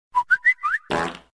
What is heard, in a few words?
alarm fart